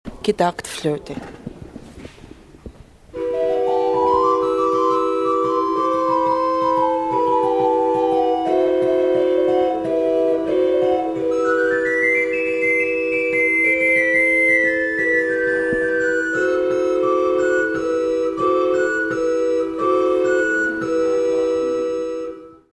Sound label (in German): Gedacktfloete, Pfeifen; Toene